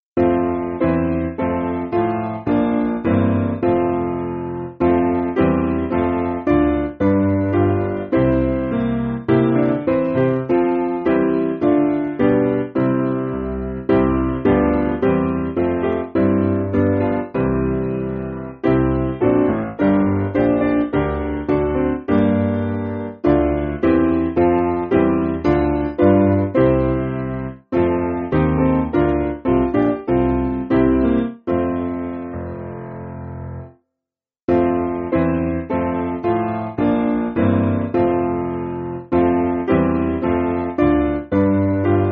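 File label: Piano, Backing Files for Churches; Dost Thou Truly Seek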